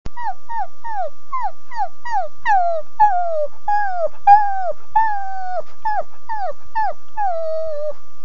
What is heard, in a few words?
puppy